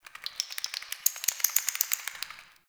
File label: Cave Shrine Critter, ambience; Midrath Island